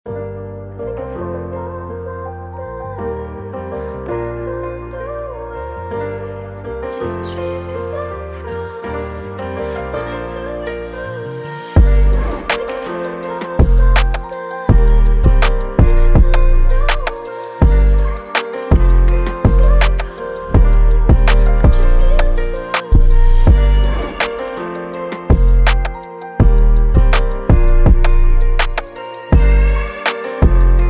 piano

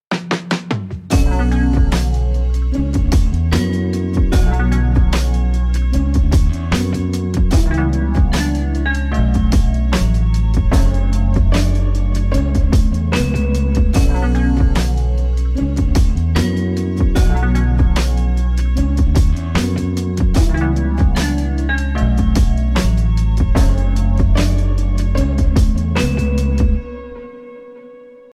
boombap beat

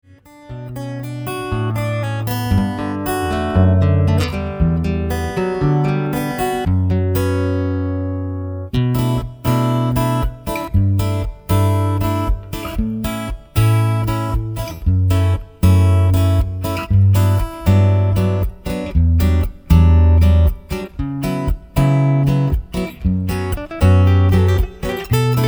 Acoustic guitars

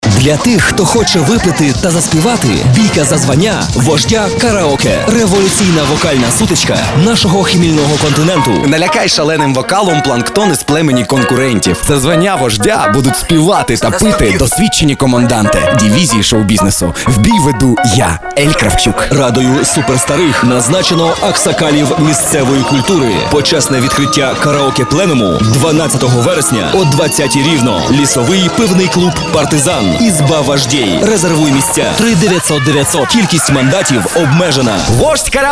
Karaoke

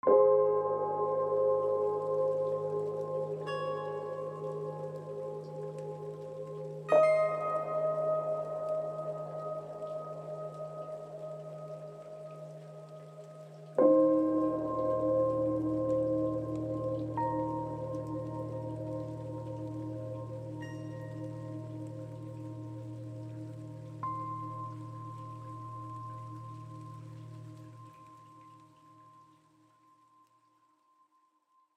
ambient

